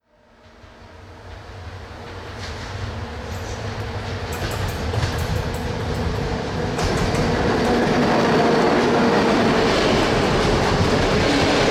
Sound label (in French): bruit joints de rails; sonotheque